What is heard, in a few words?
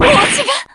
damage; virgilia